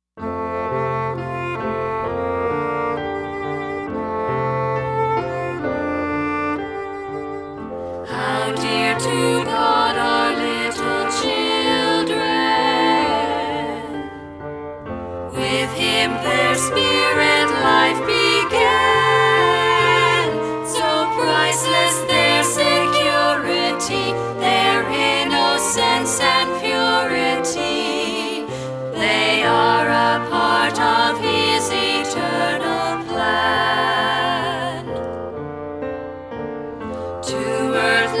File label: how dear to god are little children words and music